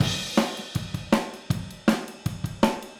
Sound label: Overhead ST, Drums, 162 BPM; Take Your Toms Off